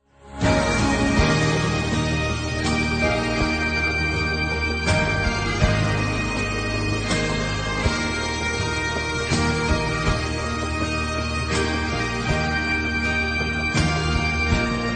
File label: amazing grace ringtone